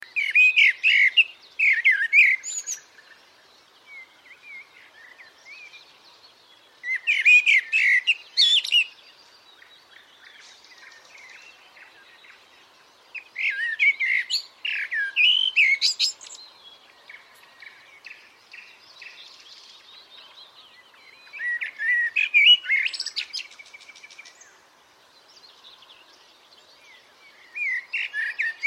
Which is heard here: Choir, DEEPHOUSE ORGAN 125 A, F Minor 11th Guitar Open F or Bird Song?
Bird Song